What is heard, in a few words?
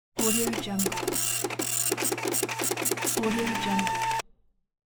printer